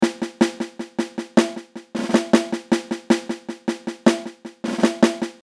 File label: Advanced Orchestra; Pikmin 3